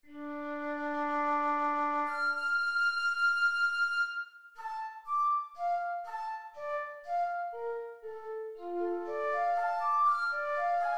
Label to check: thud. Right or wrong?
Wrong